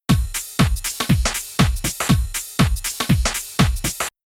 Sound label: Midi Audio Loop Drums